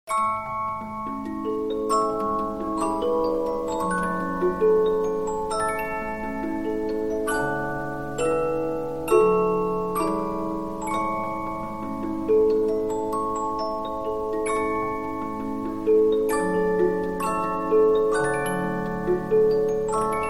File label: Polyphon, 15inch disc musicbox